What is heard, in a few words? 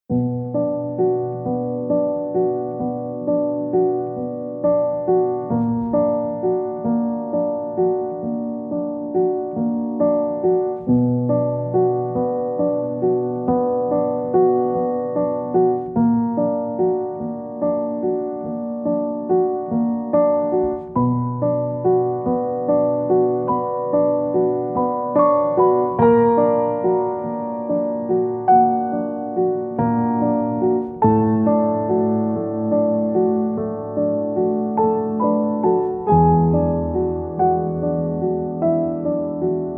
Olde English Night In; Noisebiscuits